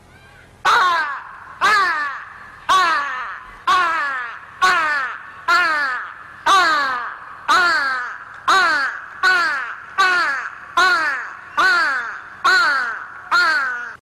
crows sound

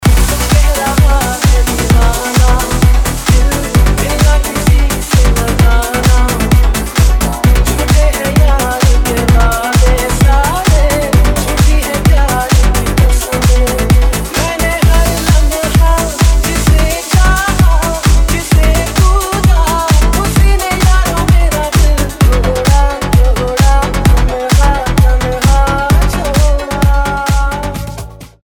remix

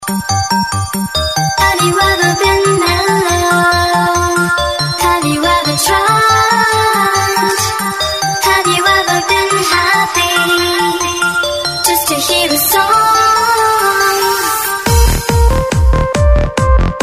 dance

